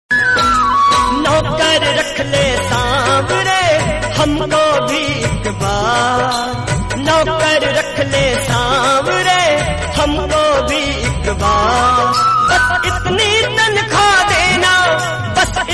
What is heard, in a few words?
bhajan, hindi; Diwali; Khatu Vale Shyam Baba